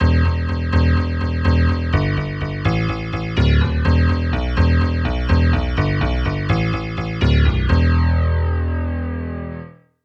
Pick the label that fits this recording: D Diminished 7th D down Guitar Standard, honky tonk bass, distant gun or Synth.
honky tonk bass